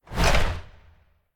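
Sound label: small swing; Smite; Cabrakan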